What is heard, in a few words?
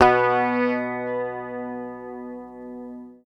PICK, Bass Library